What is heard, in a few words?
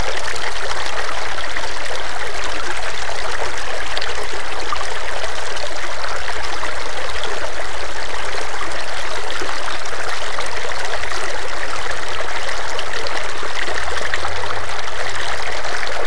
stream